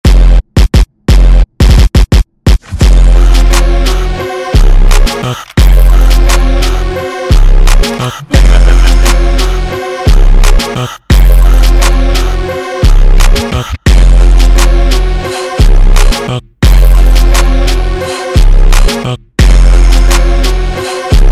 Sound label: Instrumental Slowed